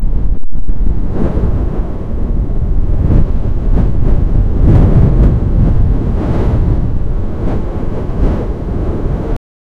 strong hurricane wind, hurricane

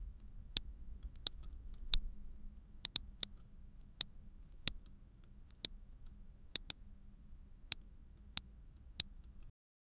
click crrraaack; crack

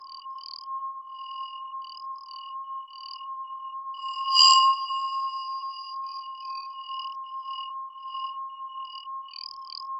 medium pitch positive crystal

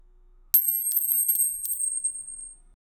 discover a tresure coins, currency

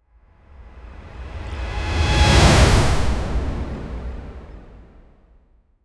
smooth cinematic whoosh; scene change

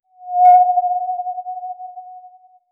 effervescent vanish tonal

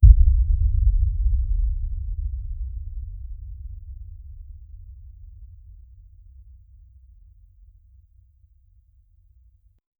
soft heartbeat echo rever

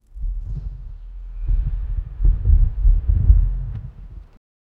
bear animal jumping sound

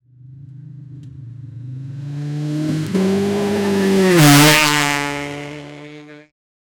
cc motorbike start and; 250cc

